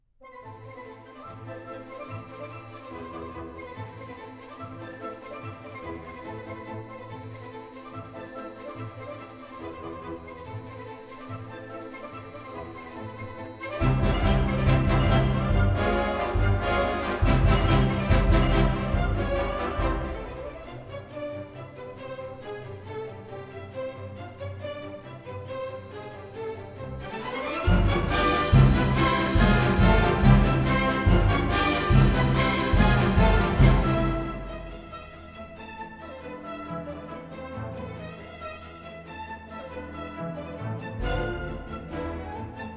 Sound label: Galopp, for orchestra; Chineser-Galopp